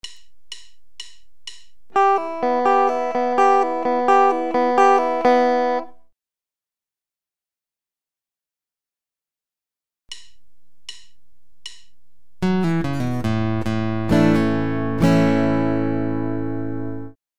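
Single-note pull-offs to open strings and strumming, guitar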